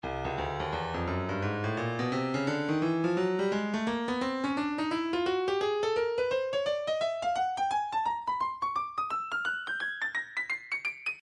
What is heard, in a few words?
breakbeat 086 bpm; Swagga D; Stylus Breakbeat Midi Files